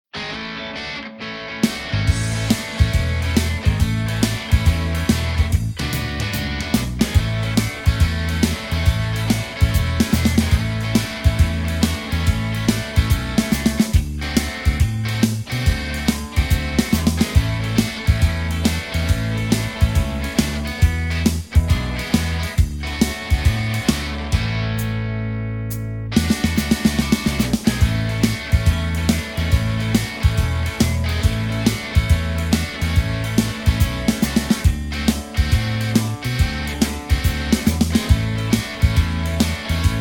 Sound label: A No Backing Vocals